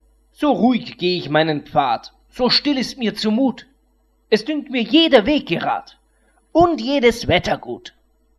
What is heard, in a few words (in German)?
Reiselied; Station